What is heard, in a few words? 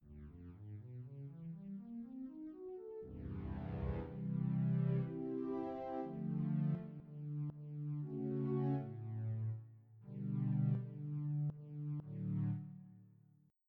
Synthy Stick